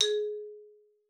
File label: G#3, xylophone; Xsample VOL-4